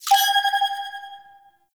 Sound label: UI Scenario Beacon Request Answer; Warframe; Railjack; Player Ship